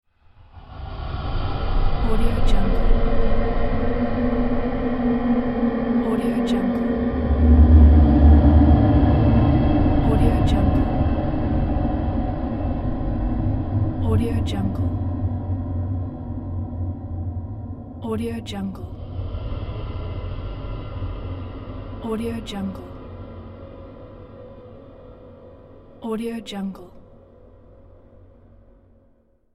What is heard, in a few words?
scary transition